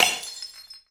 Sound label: Organic Percussion Shot; Future Bass; Drums; One Shots